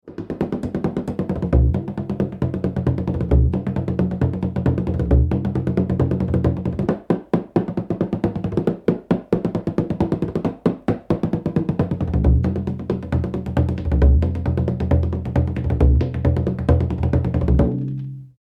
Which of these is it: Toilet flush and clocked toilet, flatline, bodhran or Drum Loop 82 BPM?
bodhran